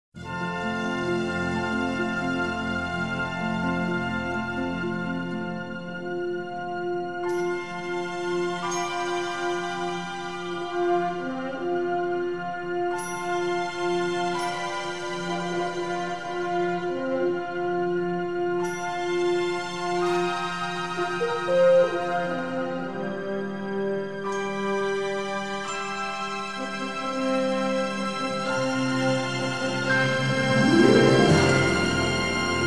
Space

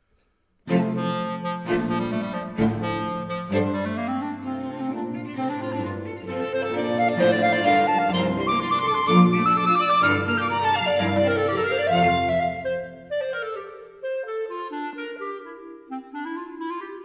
Bagatelles Fughetta Allegro Vivace; Five Bagatelles V.

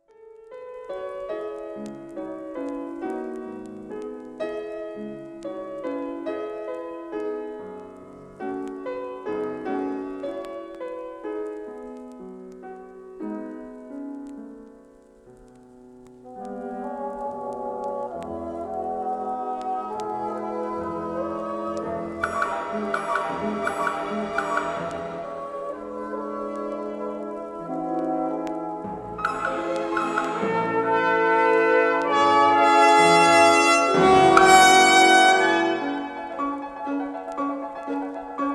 Piano; Ravel Piano Mvt 1; Philharmonia

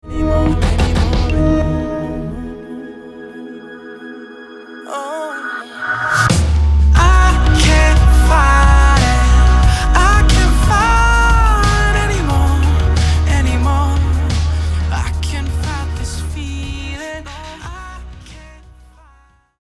Fight sting; Mastering Samples

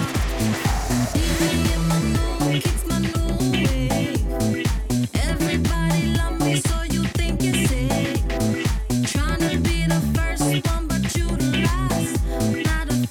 Drunk to funk

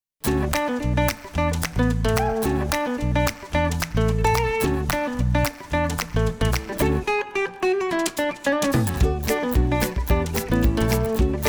French market

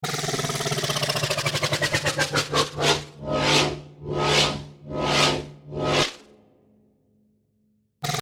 Serum Spaceship Engine

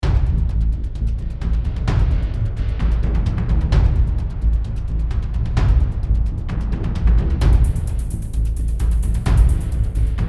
Fight Music

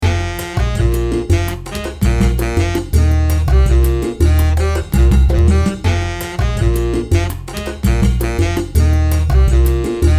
Bangin Mambo

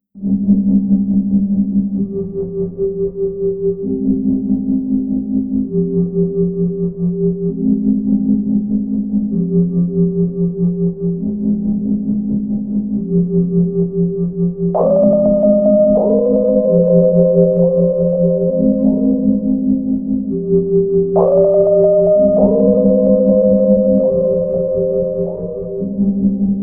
locked groove

